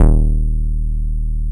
D#1, BASSES

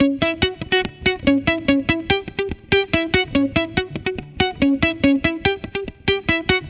guitar, stringed instruments

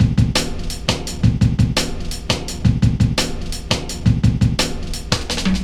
Break, Drum & Bass, Beats & Breaks; Sonic Foundry (Sony Creative Software) - Fluid Dynamics Drum & Bass

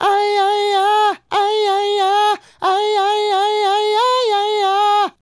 Male Solo, Hip-Hop and RnB Vocals

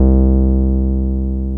TB303 SQR, ROLAND TB-303 BASS

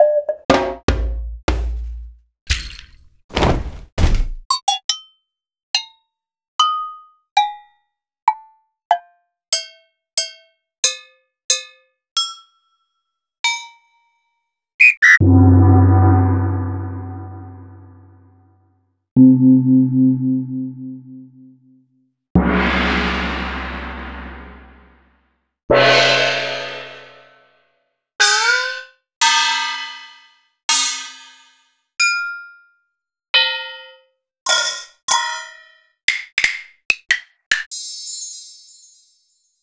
Roland BK-5 Percussion

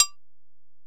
Ac Perc, Drum Machines Alesis DM-PRO

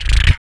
VOC PERC, Vocal Planet Jazz FX BASS PERC

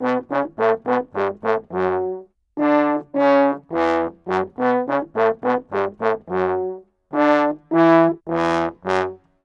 105 Bmin, Brass; 90 s Sample CDs; PowerFX - Downtown Orchestra

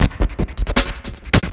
Pigbreak Beat, Drum N Bass; Sonic Foundry (Sony Creative Software); Knutrix Drum & Bass

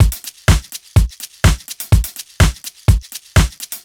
house basic, 125 Beats

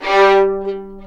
G3, VLNS ARCO; East West; The Ultimate String Collection

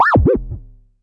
vermona drm1 mk3 fx

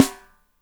SNR; Spectrasonics; Burning Grooves

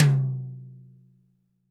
TD-20 Tom A, Roland TD-20 Toms

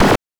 BANK2 SFX, NES DPCM; MIDINES

